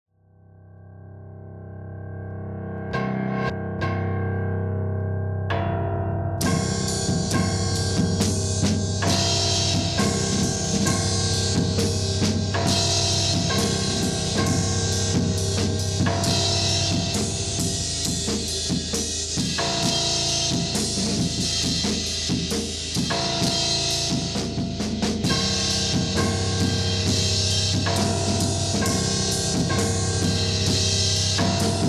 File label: Piano